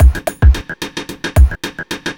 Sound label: block rock kit